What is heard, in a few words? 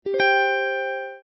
notice